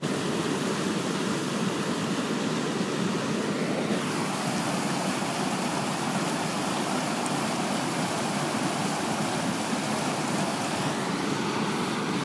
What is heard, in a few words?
Canal